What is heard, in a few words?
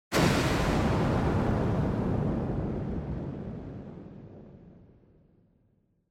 Onoma Rumble Short